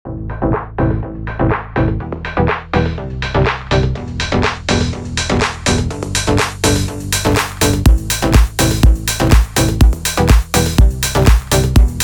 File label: Delicious House Drums Loops